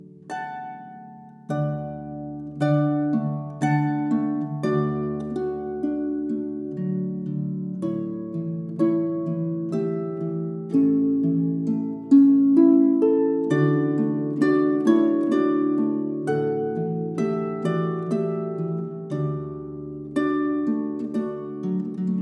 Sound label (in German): Menuett aus Sachrang